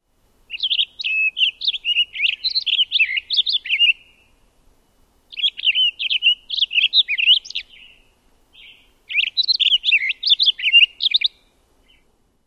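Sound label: TOWSOL short songs, Townsend's solitaire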